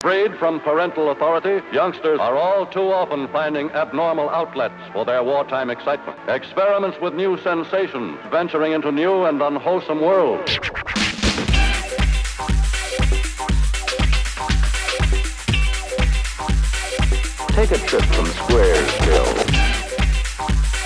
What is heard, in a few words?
kicks, ampeg